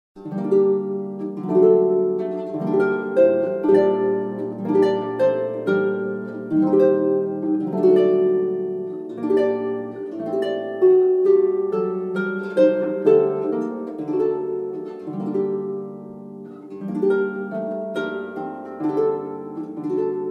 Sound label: celtic harp; harp of gold